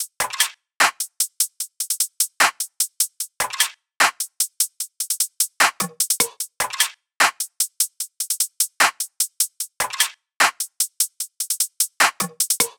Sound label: Top Loop - 75 BPM, Hip Hop; Drums - Loops; Cymatics - 9God Hip Hop Sample Pack